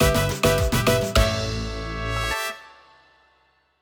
win stinger; Disco biscuits

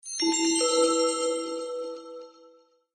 quest sound; Caravan Beast

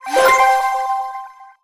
ui phone connected

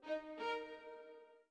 orbit violin; Sky Children of the Light gamerip

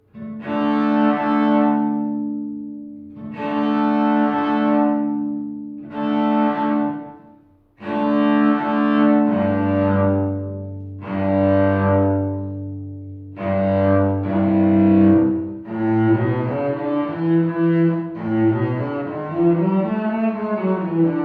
Cello Scales; Nancy Drew: Warnings at Waverly Academy

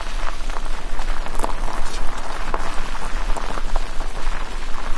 Vehicles Generic

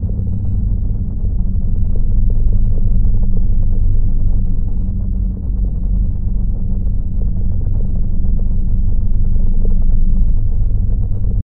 Loop

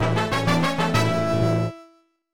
Unknown Jingle; Pokemon Mystery Dungeon Blue Rescue Team

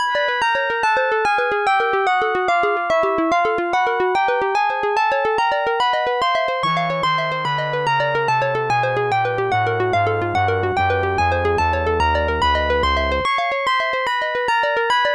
Plane Falling; Leisure Suit Larry 5: Passionate Patti Does a Little Undercover Work; IBM PC AT

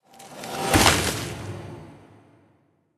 Tower freeze; Level22 Winterqueen

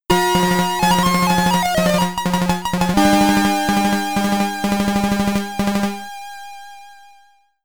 Game Over, SID Stereo, commodore 64

